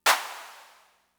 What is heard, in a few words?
Clap, Fricke MFB-522, Drum Machines